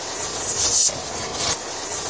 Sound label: engine loop; scmod; combot